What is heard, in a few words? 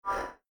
Brick Mouse Over; disneyxd; bryx